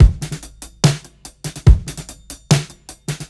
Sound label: FAT 145 32ND BOUNCE, DRUMS LOOPS; BASEMENT DRUMS VOL 2